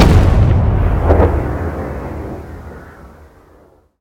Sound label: shoot; Barotrauma; Barotraumatic; Fractaldestroyer